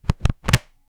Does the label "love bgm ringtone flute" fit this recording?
No